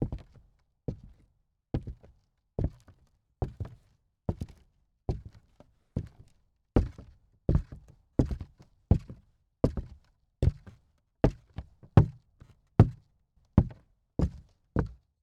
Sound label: Walk Wood Creaks; Footsteps; HauntedBloodlines